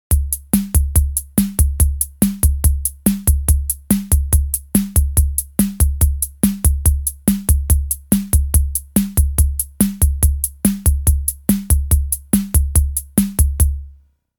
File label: RHYTHM DISCO-ROCK